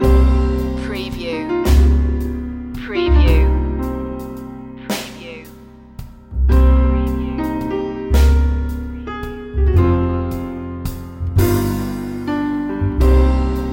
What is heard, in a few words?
bleak days loop